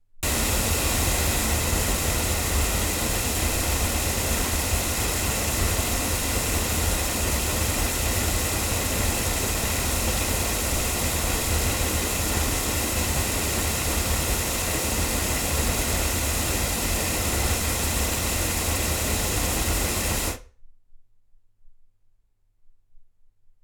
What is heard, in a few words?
ADK A48 cardioid loudspeakers